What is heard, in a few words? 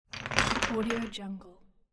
wood blocks